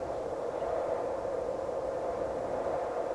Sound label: Snow Ambience